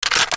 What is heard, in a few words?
bow bolt